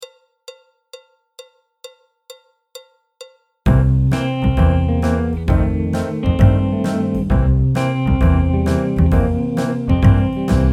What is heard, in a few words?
Sidlin' Sam BT (clarinet, flute, trumpet, trombone, piano) Extended; Jazz Syllabus Level 1